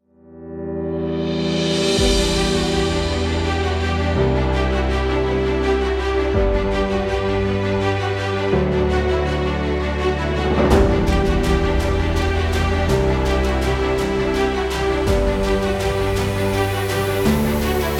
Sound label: Epic, epic movie trailer music